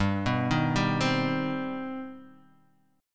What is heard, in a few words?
C Minor Major 7th, G, chord